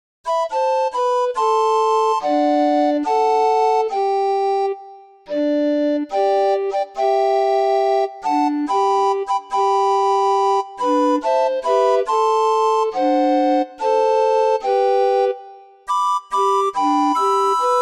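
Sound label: oh X-mas tree, orgue de barbarie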